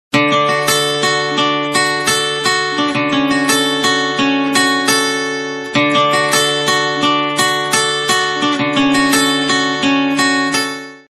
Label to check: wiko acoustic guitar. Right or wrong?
Right